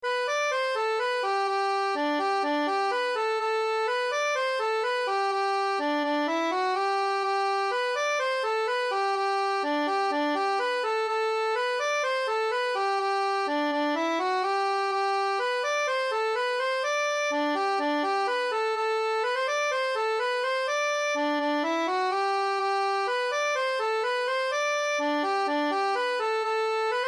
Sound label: Polka, Une petite polka très simple en Sol; Gan Ainm Polka